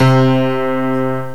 honky-tonk, piano; Software Explosion 2 - Virtual Computer Pets; yamaha xg

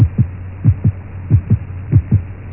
heartbeat; backbone

